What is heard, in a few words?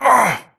grunt hits; Titanfall 2